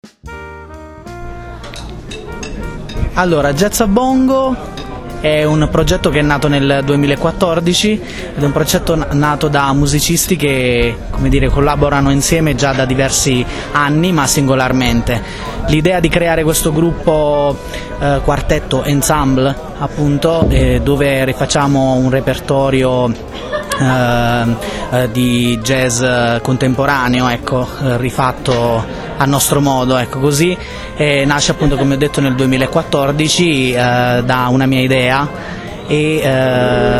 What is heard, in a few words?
BONGO JAZZ